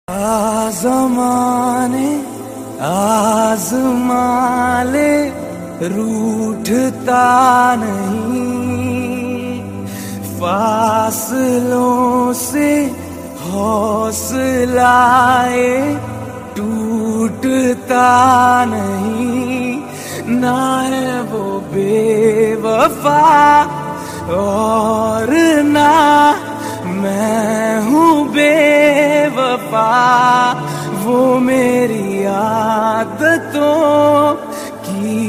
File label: Heart Touching Sad Ringtone